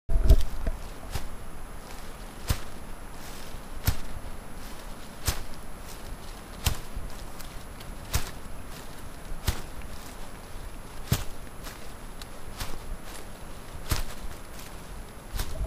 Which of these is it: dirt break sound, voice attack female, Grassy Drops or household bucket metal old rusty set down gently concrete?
Grassy Drops